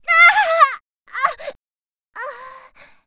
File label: die; Eternal Return; Tia; ENG